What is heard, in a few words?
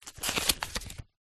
household book very small page turn; zapsplat